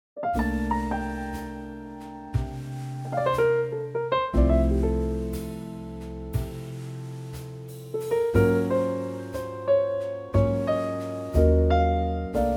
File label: F trio